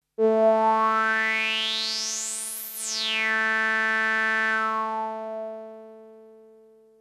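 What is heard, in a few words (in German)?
Filter Hüllkurve mit Resonanz, Beispiel ADSR mit Resonanz; virusc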